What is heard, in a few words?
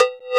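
COWBELL, DRUMS; CMP - Analogon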